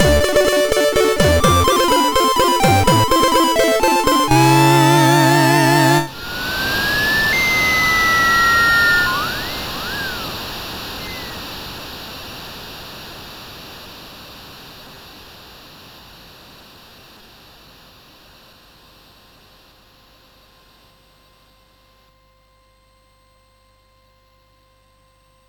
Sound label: Fanfare, MOS6581R4; Fanfare of Heroes